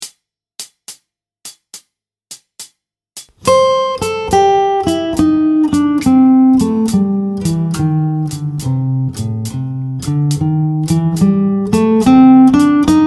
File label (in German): Zusammenspiel ohne Begleitung